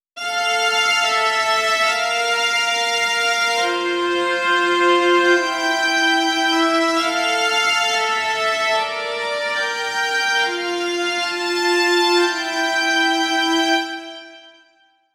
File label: Celli, 140 BPM; Vengeance Samples; Song Kits; Take Me Away